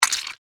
Human Zit Pop Squeeze Squish; Objects

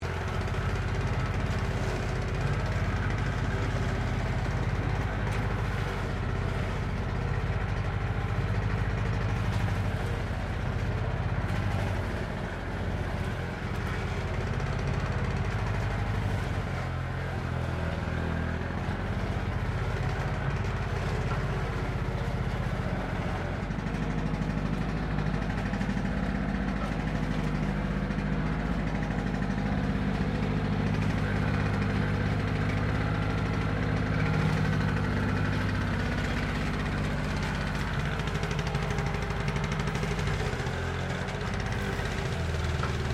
LAWN MOWER OPERATING DISTANT LOOP, Old lawn mower; Victor; Lawn Mowers; Machines

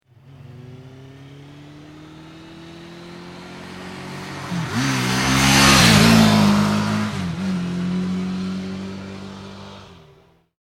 Motorbike Suzuki 650 Pass Fast; Motorcycles; Vehicles; Tom Hutchings

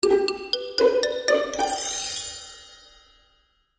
Magical Music Finale, Cartoon Music Sound Effects

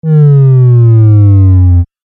Laser Beam Power Down

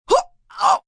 Grunt Short Multi, Grunts, groans, impact, female; Groaning

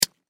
Industry Switch Toggle Flip Click Single; Factories (Sound Effects:Backgrounds)

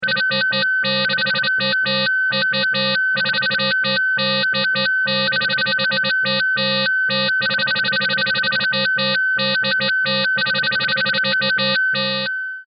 SciFi Scanner; Communication; Perfect for general Science Fiction usage or Multimedia usage

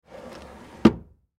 Drawer Close Furniture; Drawers; Household; Blastwave; Foley

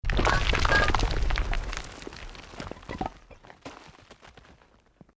ROCK DIRT SLIDE SMALL; Created dramatic effects of buildings collapsing or breaking; Rocks; Materials

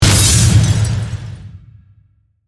Hit Burst Shatter, Debris, Short; Production Element, Imaging Element, Accent, Transition

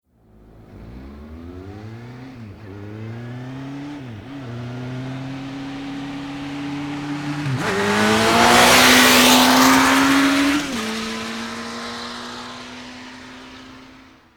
Motorbike Honda 1100 Pass very fast; Motorcycle; Tom Hutchings

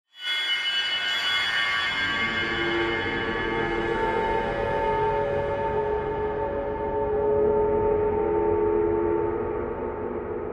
Horror Swells Metallic High Eerie Dark; Scary Tones; Blastwave

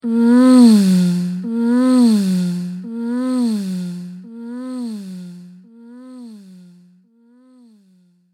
Sex Groaning Woman With Delay

